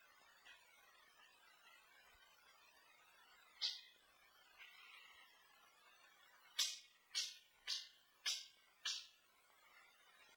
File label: Hammering heard in Stable